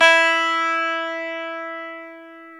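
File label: E 12 String guitar; Club-50 - Foundations Roland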